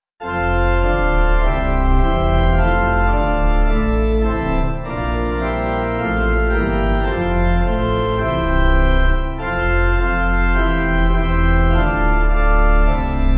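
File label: Pipe, hymn backing; Look Ye Saints; Coronae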